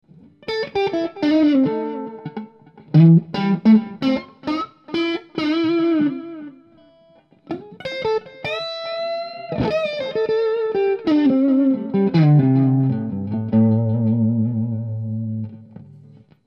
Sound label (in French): blues solo echo, guitare